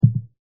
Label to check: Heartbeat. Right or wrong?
Right